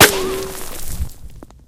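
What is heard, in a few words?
ric, ricochet; Left 4 Dead; Source Engine; weapons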